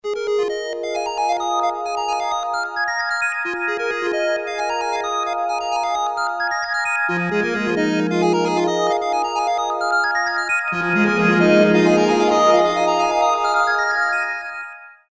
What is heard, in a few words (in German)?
taschenrechner ringtone